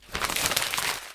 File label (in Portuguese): Desamassando Papel; Narrativa